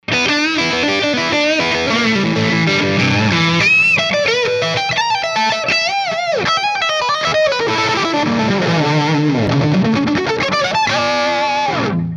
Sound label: strat lead